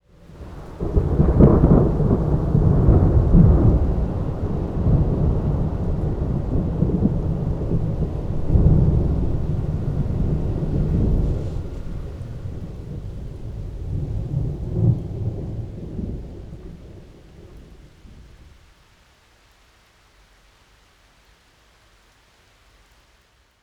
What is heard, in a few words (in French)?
Orage